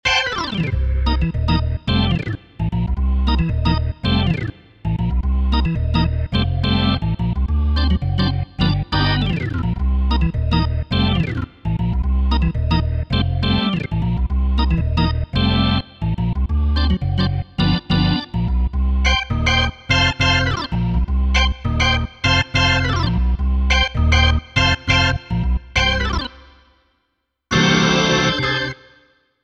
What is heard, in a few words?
rock; cms weihnachtskalender 2023 freeware